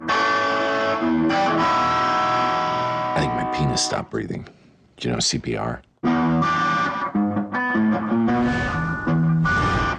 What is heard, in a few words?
House riff